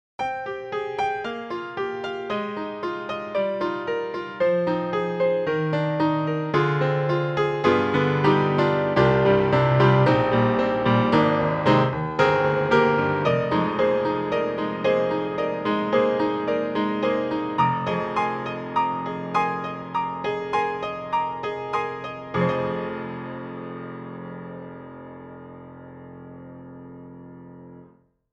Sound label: D-PRO, Grand Piano; Cakewalk; Grand Piano 3v m3 rt